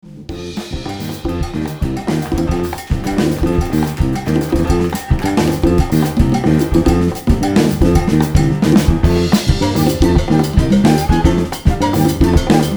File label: Latin Jazz